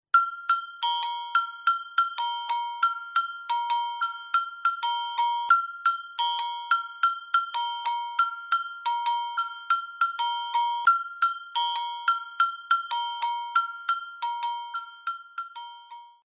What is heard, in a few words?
agogo; lateinamerika